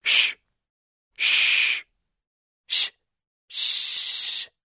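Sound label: person shushing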